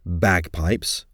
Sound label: bagpipes